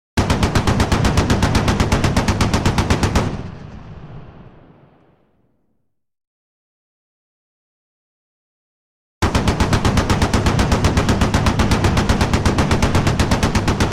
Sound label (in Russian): pulemet strelba iz pulemeta